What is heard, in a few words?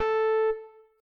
countdown; tetrio